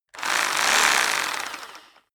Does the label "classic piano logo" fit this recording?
No